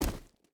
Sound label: footstep rubber boots gravel jump land; Rust